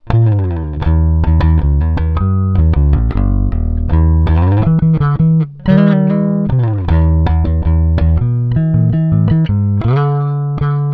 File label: Aria Diamond 1820